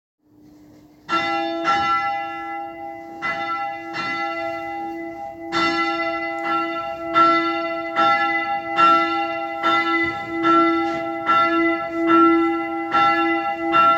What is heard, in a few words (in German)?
Glocke